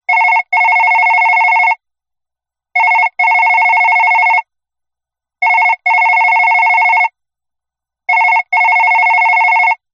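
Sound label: Telephone 4 Rings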